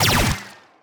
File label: laser blaster